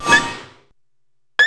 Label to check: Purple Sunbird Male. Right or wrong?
Wrong